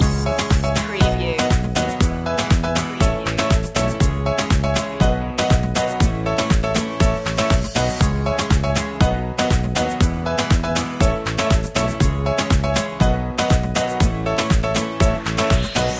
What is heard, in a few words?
guitar loop; media music now